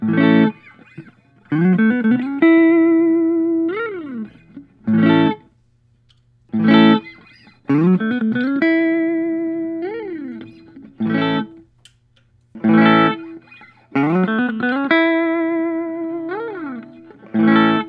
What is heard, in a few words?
Paul Reed Smith NOS Modern Eagle